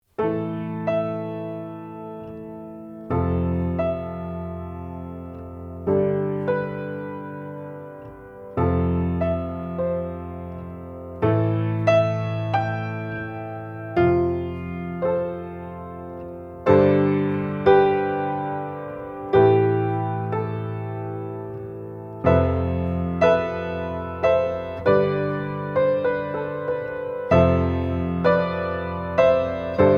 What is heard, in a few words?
Piano; AMI V78M WPK